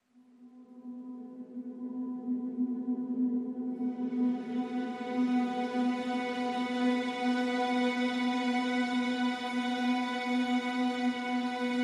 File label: Pads Ghostly Whispers (B)